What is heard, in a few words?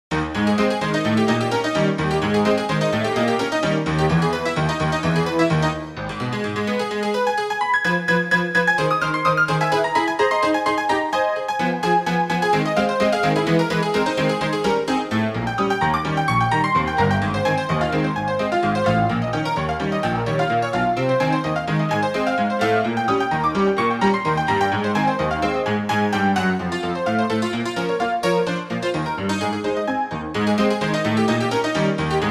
player piano